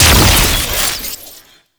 Explosive Impact

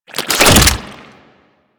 Infested Collar Attach; Warframe; Infested Alad V

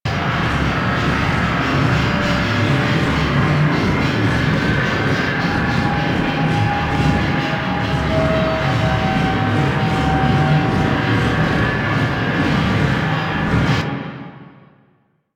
Connector Loop; Warframe; Ambience; Entrati

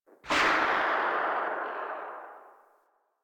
Vomit Gun Tail Ext, Weapons, Infested; Warframe

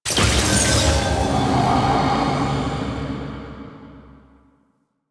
Bellow Pickup; Warframe; Entrati

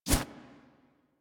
Melee Block Stop; Warframe; Weapons; Whooshes

